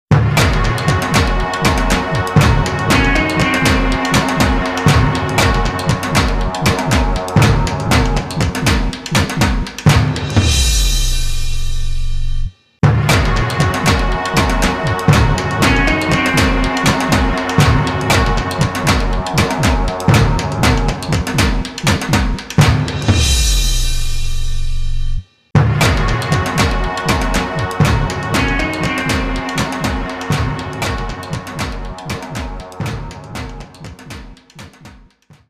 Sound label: Jingle, boss intro; asterix obelix xxl 2 mission wifix 2006 playstation portable gamerip